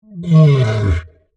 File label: Monster Groan, Horror, Monster, Groan, Creature; Deep; Monsters (Sound Effects:Horror)